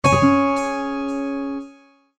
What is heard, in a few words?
Highlight Calendar, Six String